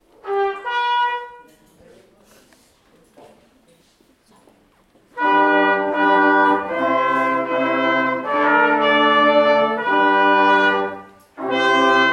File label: music concert